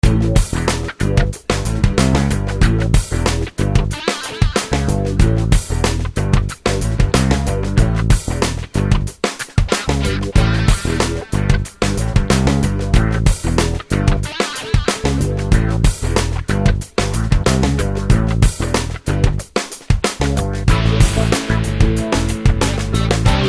Classic Vibe; Gadgets Design It Build It Play It; Windows

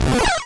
squash; Dino Run

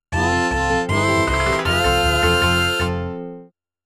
Horse Race Goal; The Legend of Zelda Majora's Mask

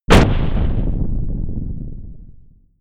Medium Collision

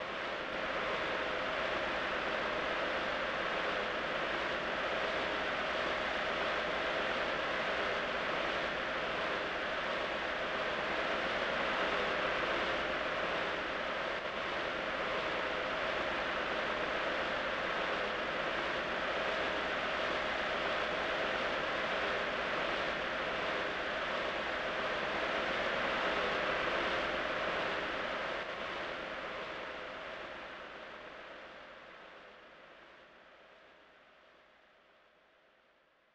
Crowd Ambience; Zico Soccer; 1994 snes

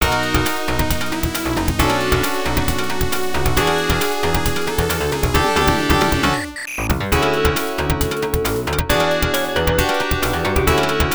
Opa-Marimba; Fantasy Zone II DX; System 16C; arcade

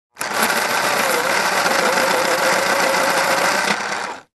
sharpener; Fancy Pants World 1 Remix

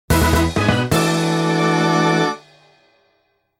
Start Grid Fanfare; Time Trials; Mario Kart Double Dash

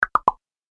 Pops Down

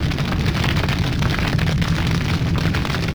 FIre Loop

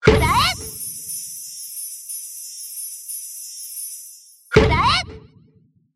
bazooka, triple shot; Mystical Ninja Starring Goemon

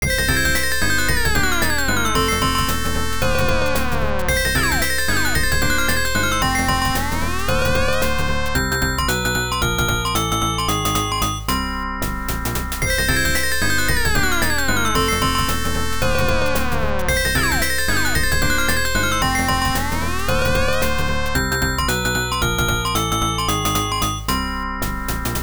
Round Clear; Twin Squash; System C-2; arcade

